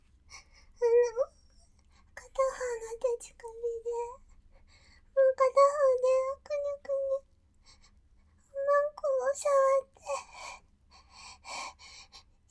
大量くちゅ音クリトリス連続潮吹き; びちゃびちゃ好き好き発情オナニー; 大音量くちゅ音

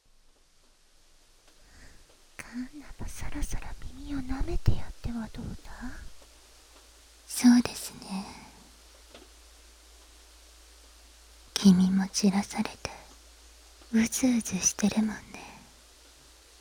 W耳舐めコース, ASMR; 耳舐め耳ふーサンドイッチ ミコミコ耳舐め庵 神様のいる茶屋

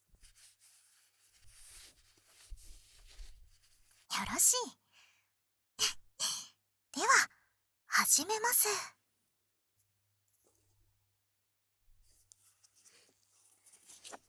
環境音 なし 耳かき 部分 のみ; 歳神さま; 3dio黒耳

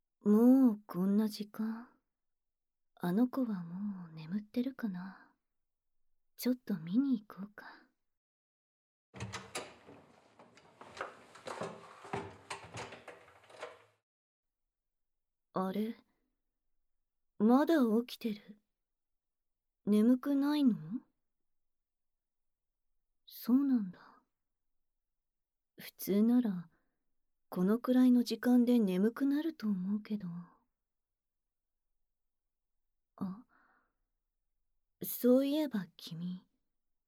ひんやり耳舐め安眠誘導

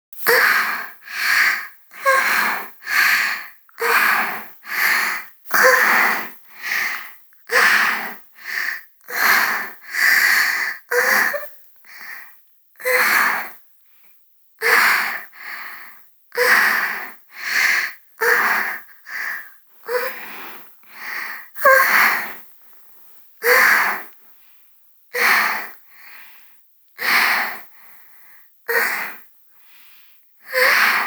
ピストン時の喘ぎ声 ゆっくり

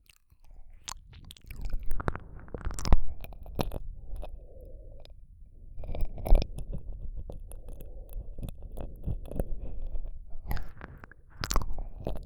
じっくりじゅるじゅる耳舐め; 【超耳舐め特化】舌の感触を楽しむ耳舐め【安眠ASMR】

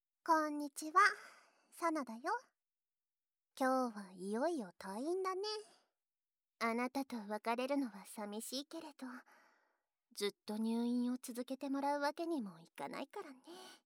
お別れ耳舐め, ASMR; ドスケベナース

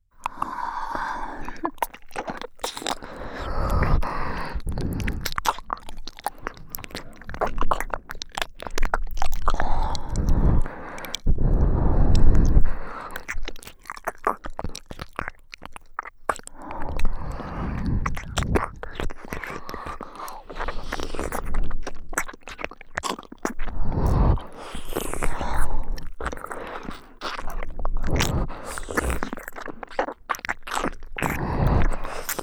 W耳舐めループトラック, ASMR

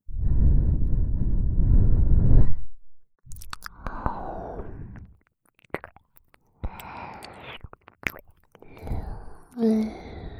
右耳のみ耳舐めループ; 座敷牢の鬼姫; KU100

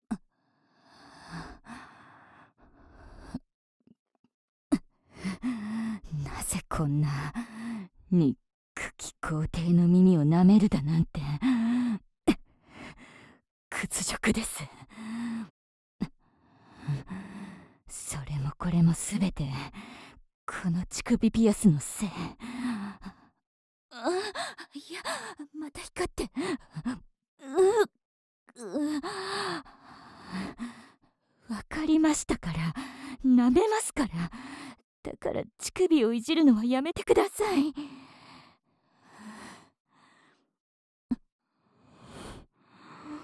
ブタ声上げながら強制耳舐めご奉仕_SE無

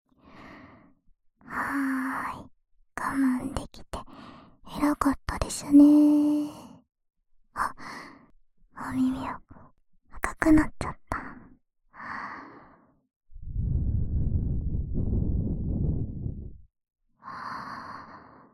【左】耳ふー, 耳かき, ASMR

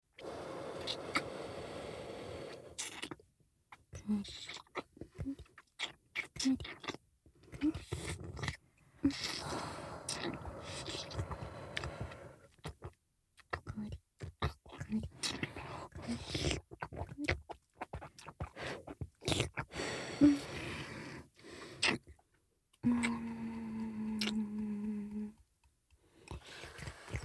乳首息吹きかけ用＋乳首舐め; 気軽にいつでもトライ！ひたすら乳首弄りループトラック編; 超初心者のための「3ステップ」乳首開発完全ガイド!～低刺激スローチクニ―から♀イキまで～

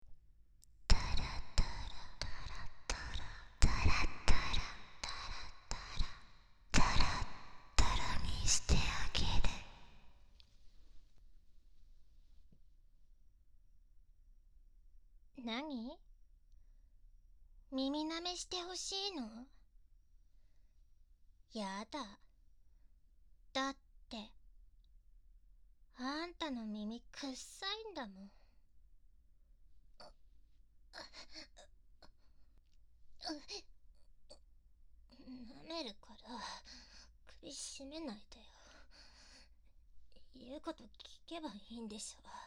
ツンツン少女オホ声耳舐め, とろとろ耳舐め ツンツン口悪少女が嫌がりながらオホ声でちゅぱちゅぱ

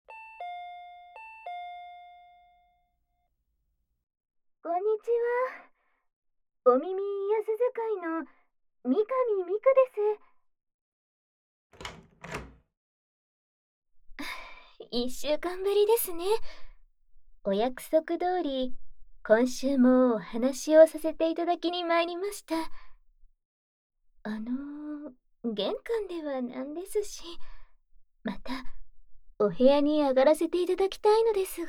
母性たっぷり耳舐め手コキ; without Adult SE; バツイチお姉さん; お耳力上昇; Short ver.

